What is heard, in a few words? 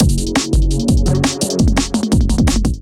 Kit Melodic 170 bpm; MIDI Clips; Drums; Freeside Kit; Ableton; Factory Packs; Skitter and Step